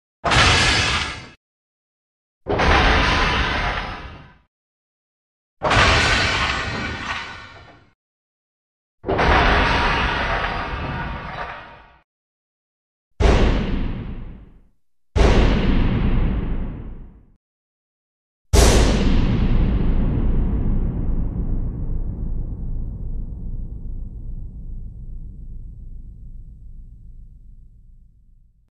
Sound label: Metal Turret Drops Large Metal Impact With Light Deb; Hollywood Edge Foley Sound Library